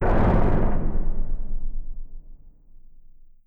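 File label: Collision Interior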